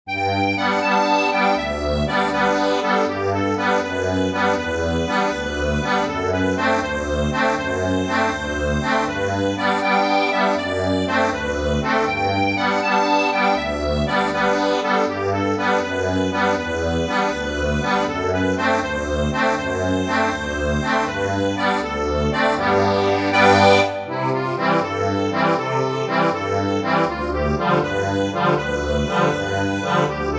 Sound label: accordion; Rags